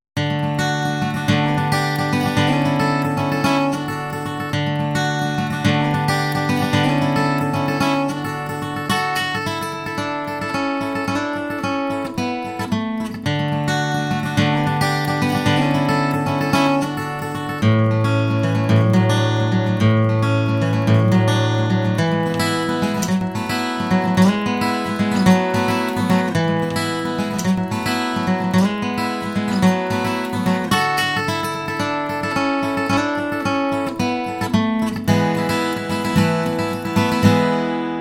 folk comp

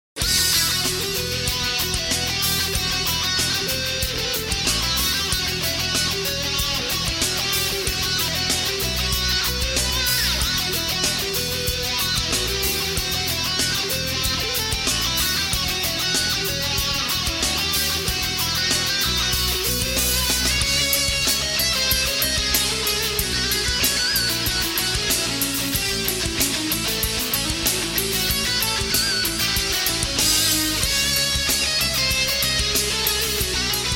classic rock comp

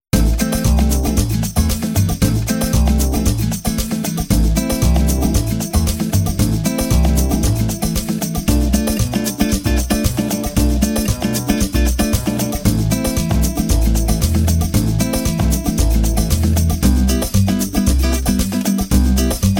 latin comp

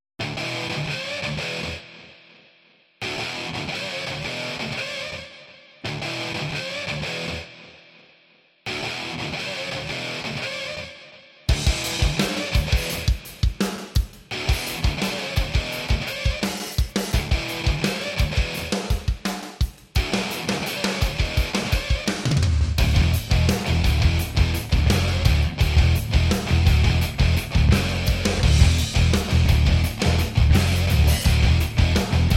metal comp

